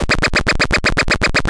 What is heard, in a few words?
Squisch, Effects; Ableton; Creative Extensions; Poli